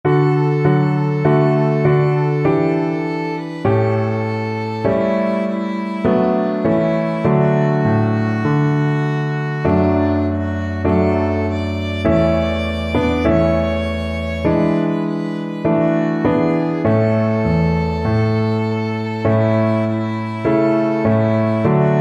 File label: onward christian violin